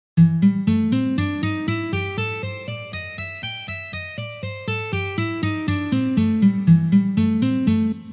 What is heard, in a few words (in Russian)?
Blyuzovye gammy Lya minor